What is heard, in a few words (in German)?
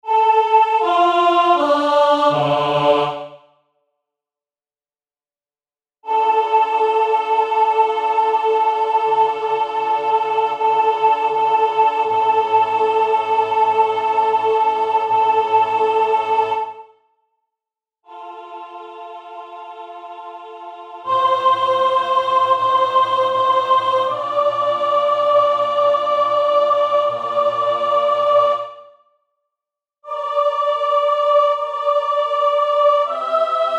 Adagio Sopran; SalveRegina